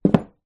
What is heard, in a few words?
household jewelry box down on dressing table